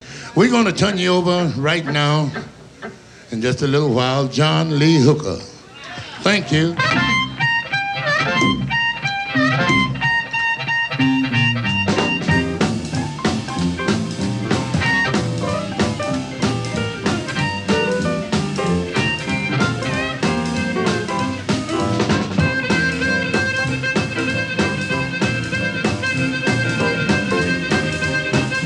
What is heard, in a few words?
Fast Instrumental